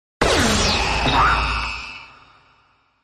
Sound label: hell sfx